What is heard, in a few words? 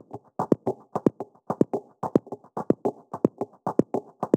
110 Organic, Percussion; Loops; Percussion (Mono); Touch Loops; ElementsOne; AbstractRhythms